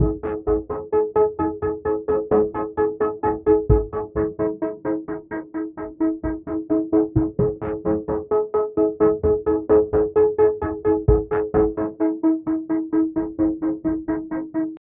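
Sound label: looped music analog horror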